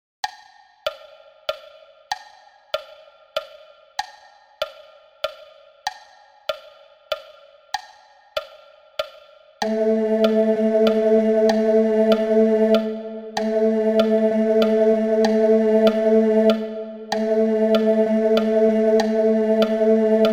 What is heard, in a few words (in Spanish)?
Canción VOZ